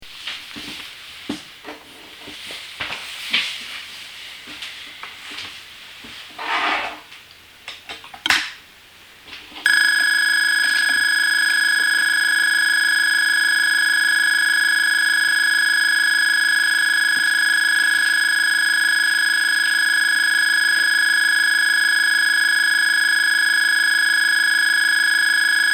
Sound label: Large Resonator